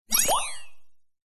science fiction computer console gui robot complex beep